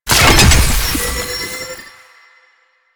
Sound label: Research Pod Start; JadeShadows; Ascension